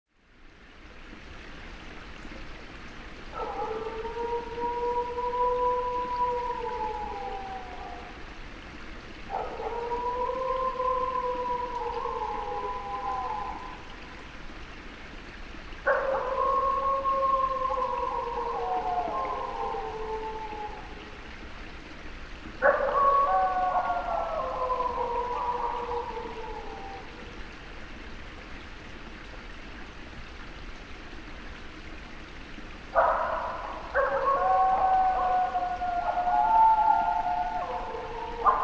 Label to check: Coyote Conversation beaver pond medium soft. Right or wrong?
Right